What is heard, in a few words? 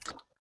fs mud, footsteps